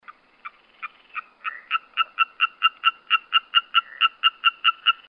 Hyla intermedia; BIOAC AMPH

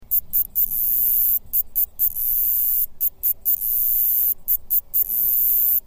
Cicadetta mediterranea

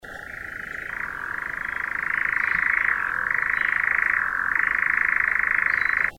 Caprimulgus europaeus; BIOAC AVES

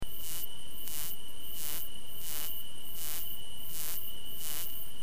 Uromenus brevicollis insularis; BIOAC ORTH

